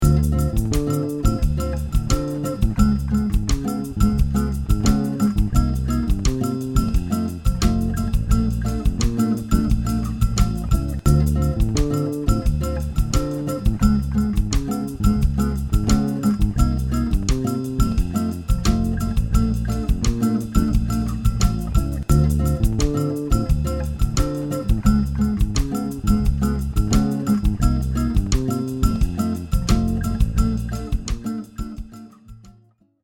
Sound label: Samba Groove; production music; Afro Cuban Brazilian